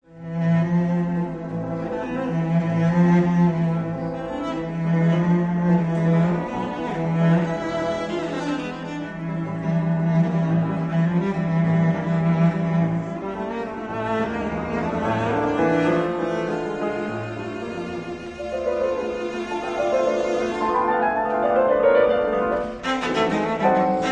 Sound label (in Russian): Соната для фортепиано фа минор Menuetto